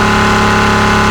fast, newCar

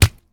bat hit; Zomboid 41.78.16; Project Zomboid

